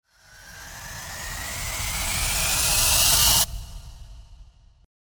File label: RISER